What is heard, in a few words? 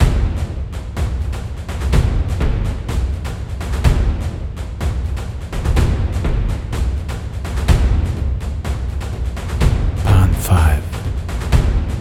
Challenge Music; Gladius VR